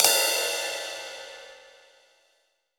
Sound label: Crash Phrase, Roland BK-5 Cymbals